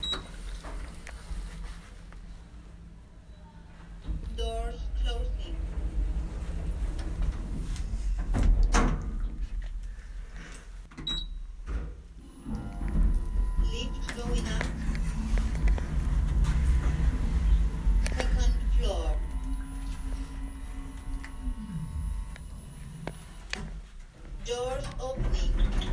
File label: Elevator